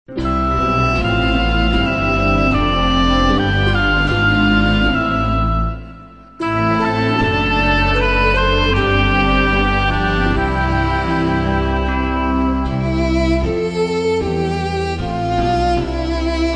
Instrumental Praise

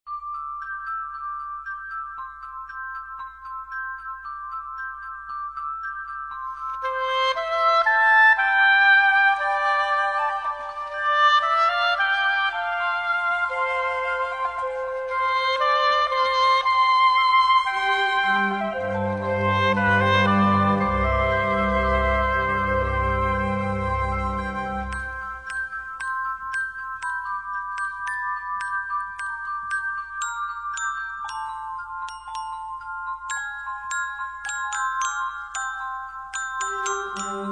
Orchestra, Strings; Majesty Orchestra

